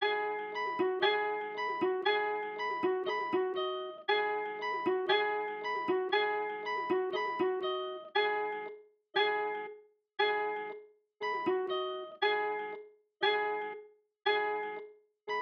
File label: 118 BPM G# Min Guitar, melody loop stem; Trap; Loop Stems; Cymatics; 2022 Melody Collection - Trap Pt 1; Great Escape